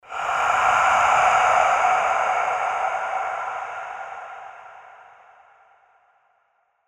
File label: breath of doom